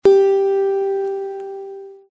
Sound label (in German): Zithermacher Ton 8 LAUTER